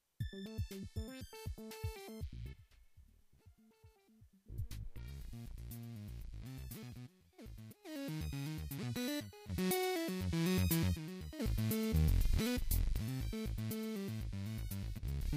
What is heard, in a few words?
juno g internal patch groove